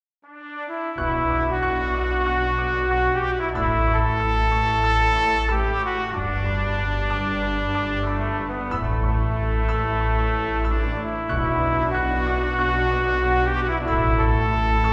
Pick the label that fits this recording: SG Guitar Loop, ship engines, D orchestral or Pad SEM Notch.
D orchestral